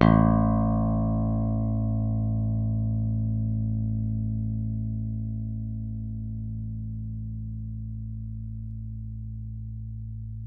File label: pick, bass